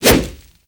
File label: Wolf Swipe, Animals; Duviri